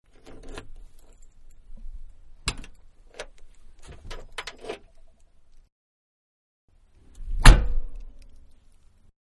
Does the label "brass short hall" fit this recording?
No